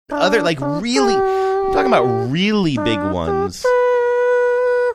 mouth trumpeting taps

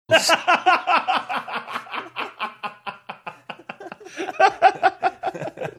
having good laugh

